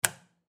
Ship Radar System Flip Switch; Ship Radar System Flip Switch Fast; Transportation Watercraft; Boat Sound Effects; Blastwave

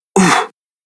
Human Fx Grunt; Andrew Fleming

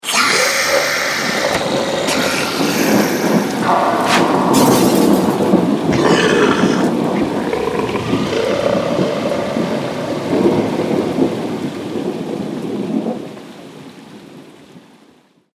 Fantasy Battle Scene; Battle Sound Effects

